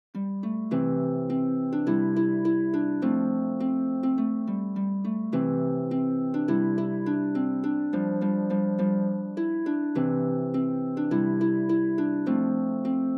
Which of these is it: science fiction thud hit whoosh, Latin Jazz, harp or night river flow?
harp